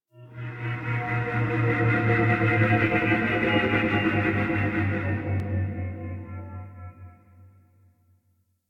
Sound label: Howls, Asteroid; Ambience; Warframe; Grineer Asteroid